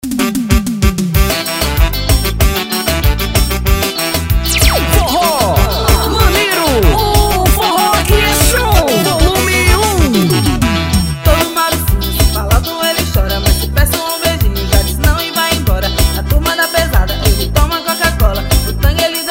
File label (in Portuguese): forro